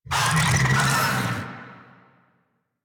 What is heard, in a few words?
Infested Big Ashen Vocal Attack Death; Warframe